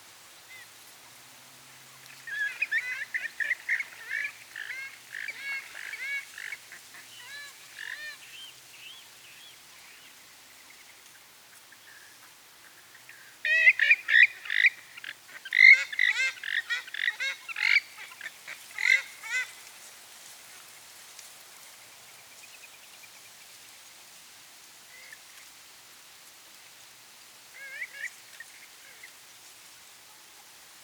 Western swamphen

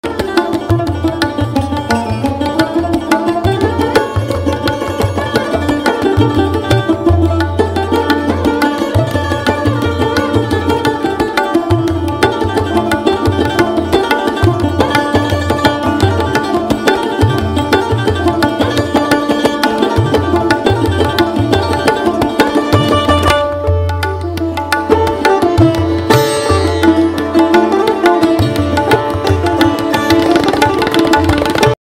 sarod

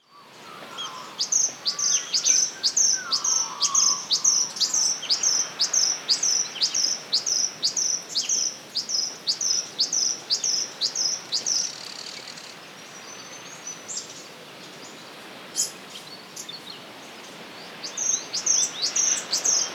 spotted scrubwren

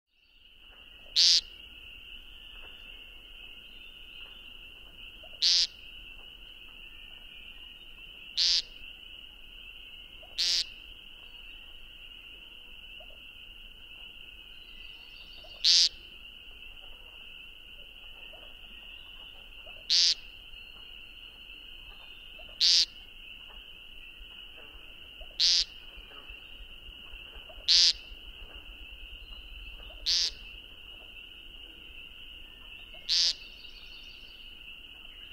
Woodcock

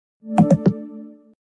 Low Battery; کم شدن باتری گوشی

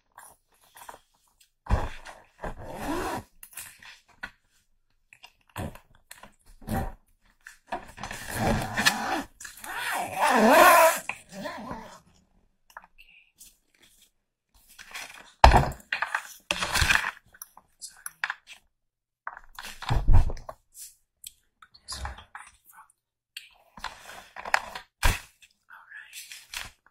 luggage; باز کردن چمدان

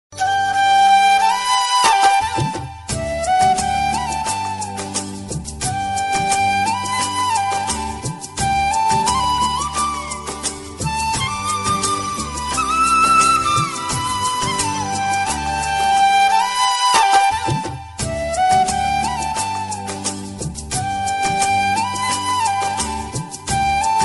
traditional ringtone

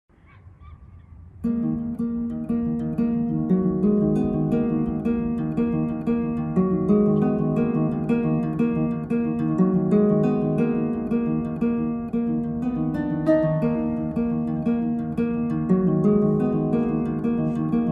Lyre

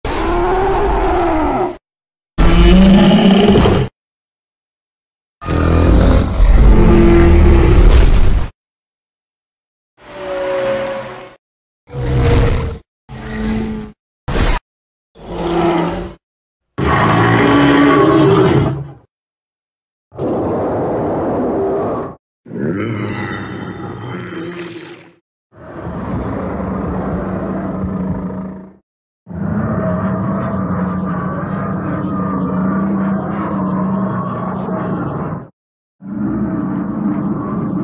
mosasaurs, موساسور

